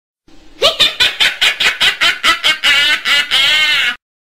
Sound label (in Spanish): Risa de la bruja, Laughing Witch